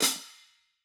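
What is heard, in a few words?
closed hi-hat; eddyflux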